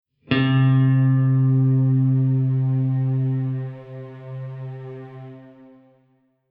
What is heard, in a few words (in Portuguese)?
Nota Do, guitar